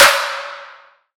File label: Snare - C#; Snares; Future Bass; Drums; One Shots